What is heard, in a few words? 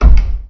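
ladder wood, player; quakemapping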